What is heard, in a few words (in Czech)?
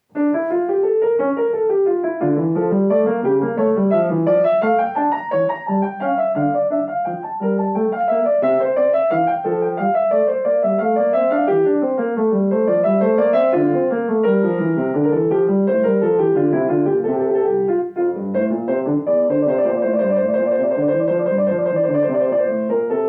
Invence d moll; J. S. Bach